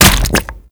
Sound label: Goo Gun Sack Land Large; Warframe; Grineer